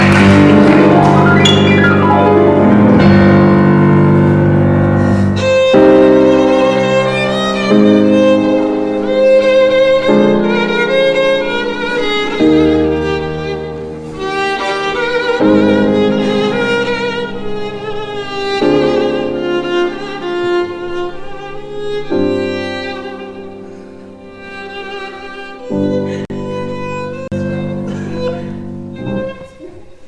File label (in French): violon